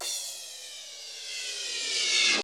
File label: EFF CYM; Crypton; DrumBase5000